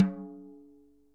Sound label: snare dry; Real Mega Drums